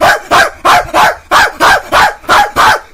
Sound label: barking